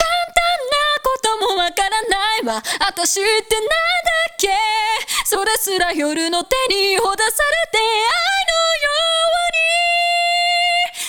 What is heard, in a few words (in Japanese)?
サビ メインボーカル